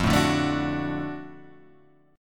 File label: Gb Minor Major 7th Sharp 5th, chord